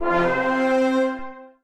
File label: Accepting a Quest; Test Realm